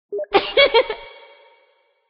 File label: Laugh